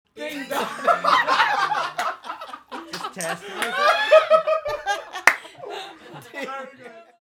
Laugh; This sound effect was recorded in a custom built sound booth

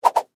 Whip Whoosh Double; Foley; Whip Sound Effects; Whips (Sound Effects:Objects); BlastWave

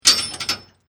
Chain Metal Clanging; Metal Sound Effects; LYN103 package of Chain Sounds; Lynne Music

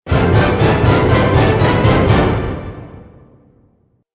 Orchestral Transition Ascending; Musical

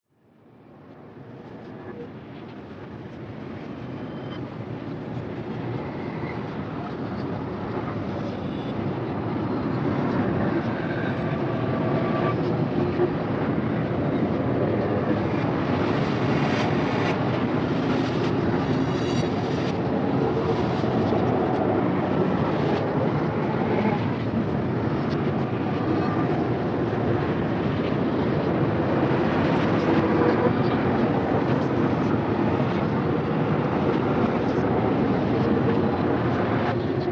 Sci-fi Horror Weird Evil Ambience; Science Fiction Sound Effect; B Movies To Blockbusters; Andrew Fleming

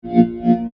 Scanner Beam By, Scifi

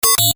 Tech beeps, Beeps, High Tech; Stuart Duffield

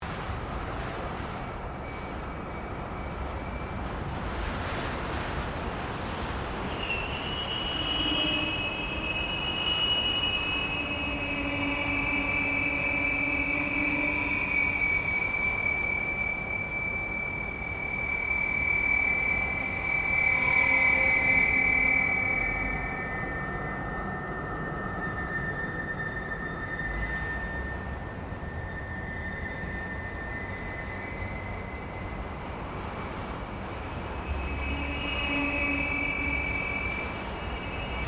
Wind And Resonance In Apartment Building; Weather

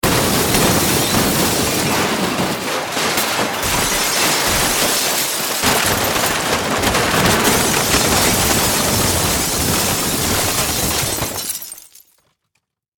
Impact Crash Roll Large Object Heavy Long; Crashes

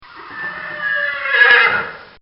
Horse Whinny; Horses; Animals; Bobby Cole

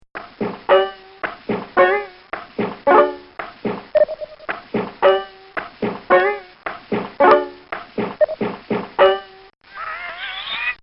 Crazy Bango Rhythm; Outdoors

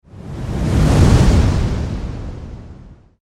Fire Fireball Large Pass By; Flames; Objects

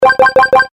Score Tally Game Loop; Video Game Sound Effects

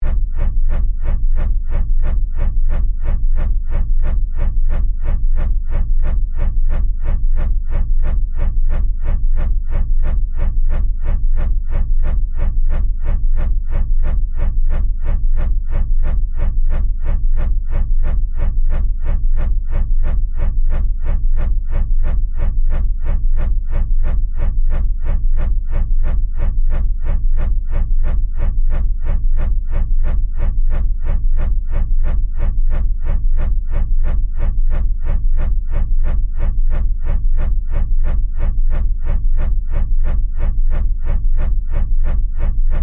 Fan Blade Rotate, Steady, Science Fiction; Spacecraft Sound Effects; Blast Wave

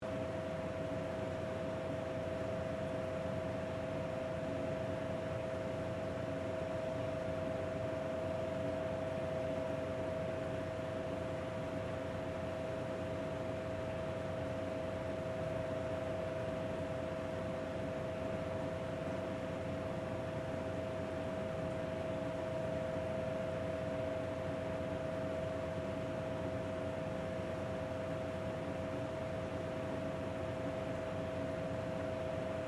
Hotel Ice Machine, Hum, Loop; Ambience; Buildings

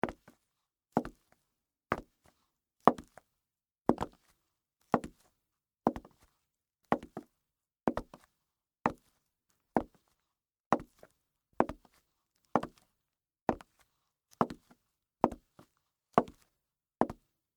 Pumps Walk, Footsteps, Peep, Toe, Concrete

